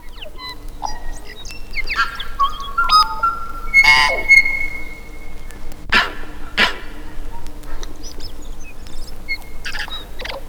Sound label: TUI